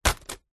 foley footstep single barefoot walking cardboard

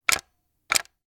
weapon gun; charles daly

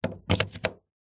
electrical plug into wall socket; household

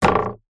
sport diving board bounce wobble; zapsplat

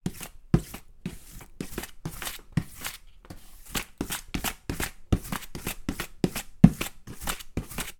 household sweeping lino floor dustpan and brush

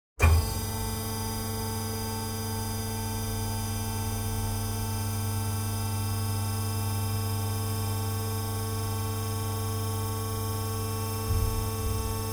Raising Platform Machinery Drone; noise creations

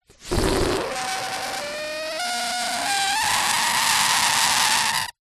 foley balloon deflate rasp

